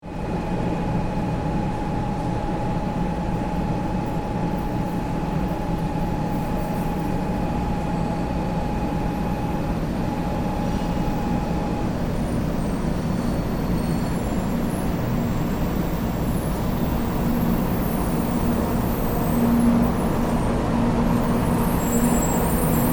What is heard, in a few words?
arrival of a bullet train